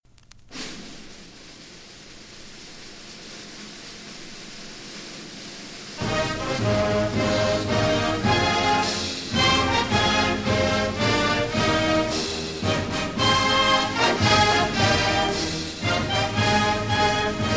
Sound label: National Anthem